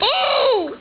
gurp; quake2; cheese

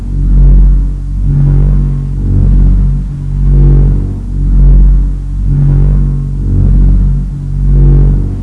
reactor core; quake2; kitsune

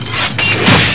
fall; quake2; tankorr

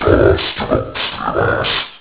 fall; quake2; minitank

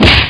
kick; quake2; weapons

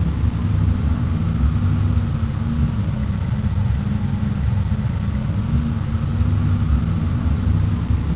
creepy; quake2; serious

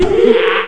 bump; quake2; martian

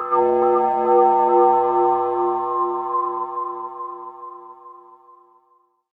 sci fly; quake2

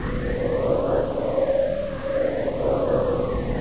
hum; quake2; chaos

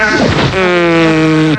death; quake2; waspy

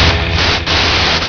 pain; quake2; attic

